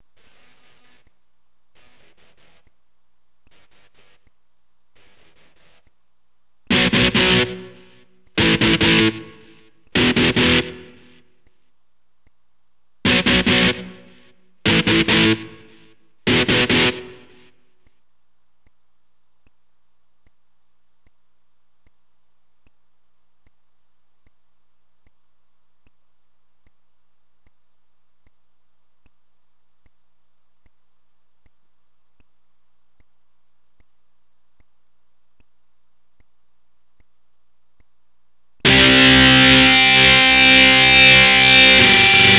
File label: e gTR